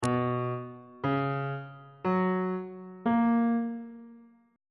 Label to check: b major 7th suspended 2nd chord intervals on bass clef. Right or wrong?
Right